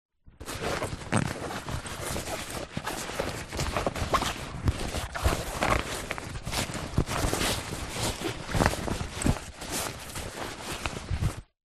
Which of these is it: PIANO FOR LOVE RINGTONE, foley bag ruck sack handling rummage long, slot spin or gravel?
foley bag ruck sack handling rummage long